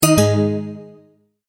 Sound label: multimedia tone end; McKinney Sound